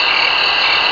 CRICKET

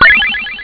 get object

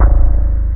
twang

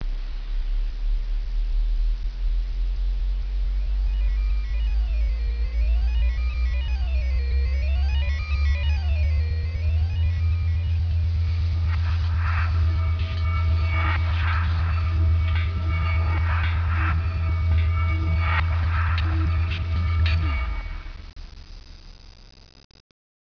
robot

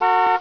HORN

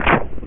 SPIKE

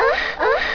UUHUUH

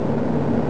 MOTOR

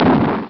explosion; Desperadoes

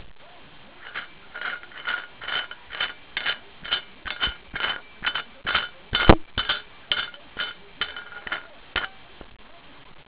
CHAINS

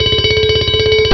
ALARM SOUND

